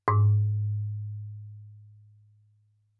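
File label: Marimba cord fortissimo Ab2; Percussion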